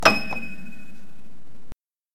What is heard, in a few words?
Piano